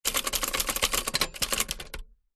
office typewriter typing fast rear close vintage 1960; lemair helvetia